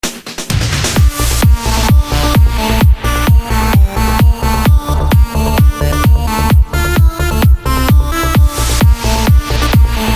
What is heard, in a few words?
EDM Tune